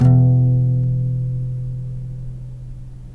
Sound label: open, Guitar; Notes